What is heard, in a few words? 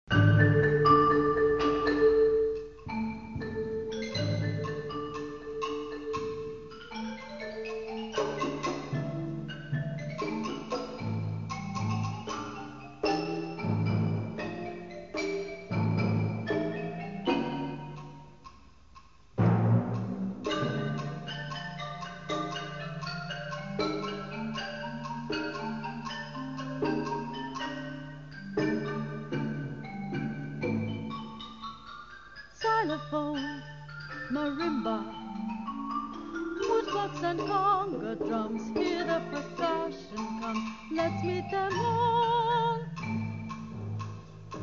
C percussion